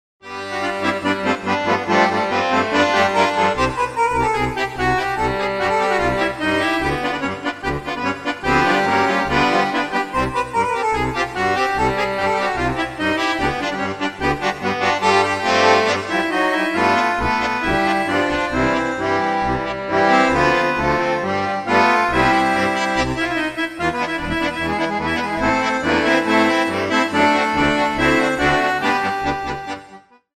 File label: uptempo, circus